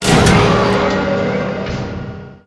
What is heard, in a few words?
skeleton move